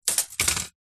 industrial tape measure plastic down on wood surface with extended metal tape; zapsplat